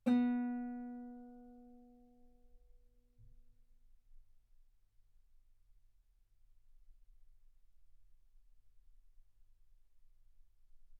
Guitar ff B3; sul B

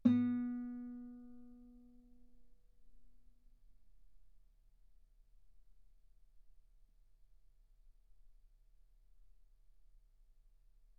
Guitar ff B3; sul B

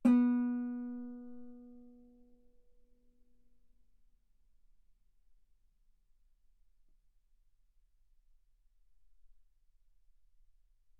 Guitar ff sul B B3

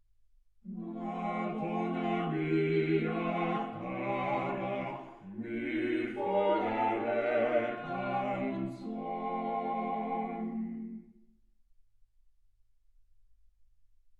quartet

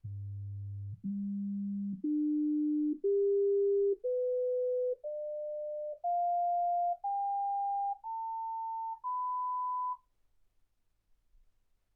sinus tones 48kHz

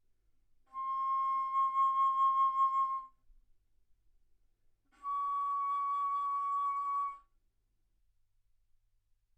Bass Flute C6 Db6; ff

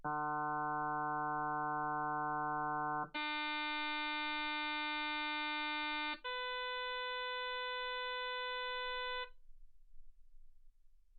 harm sinus 48kHz

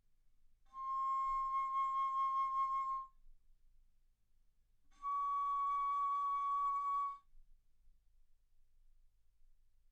Bass Flute ff C6 Db6

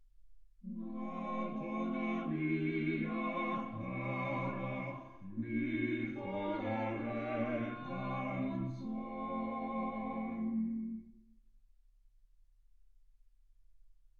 quartet

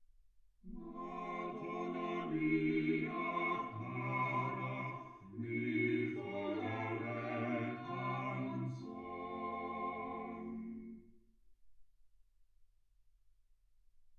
quartet

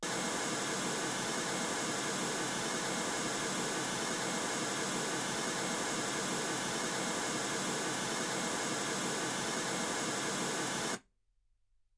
mls 48kHz

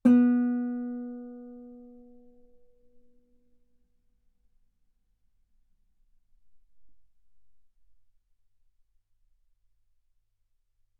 Guitar B3; ff; sul B

